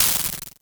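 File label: Klone Dual Percussion Synthesiser noise mod; KLONE FX NW8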